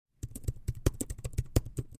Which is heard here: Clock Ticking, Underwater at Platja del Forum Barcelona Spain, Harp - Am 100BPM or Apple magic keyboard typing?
Apple magic keyboard typing